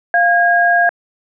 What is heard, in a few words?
dtmf tone A